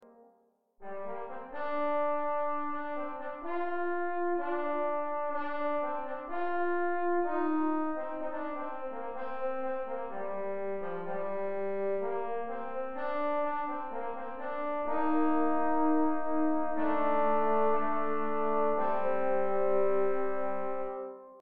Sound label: Trombones